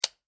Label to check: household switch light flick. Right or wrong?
Right